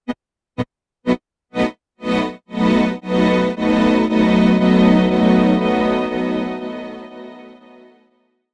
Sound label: strings bias